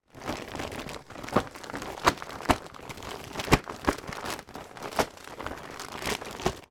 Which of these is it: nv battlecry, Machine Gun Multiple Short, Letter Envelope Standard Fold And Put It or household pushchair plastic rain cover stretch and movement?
household pushchair plastic rain cover stretch and movement